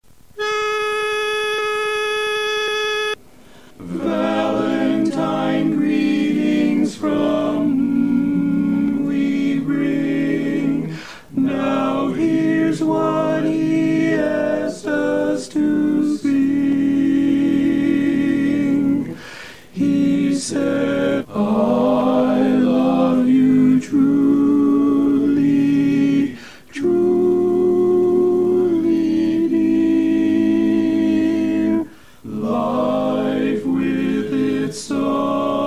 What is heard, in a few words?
TENOR Greetings, barbershop quartet